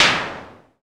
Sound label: DMA XD-5, DIGITAL; 90 s Sample CDs; Sound & Vision - Gigapack I CD 1 (Roland)